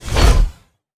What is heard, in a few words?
Rocket Long Release